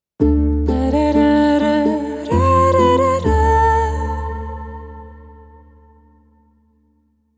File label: auditory identity vocal; canakkale